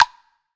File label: Perfect Wooden Snare